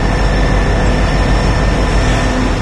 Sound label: train idle; ambient; Source Engine; video game